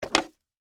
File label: Skateboard Skid, Step, Flip, Cement, Surface; Sports; Skateboarding Sound Effects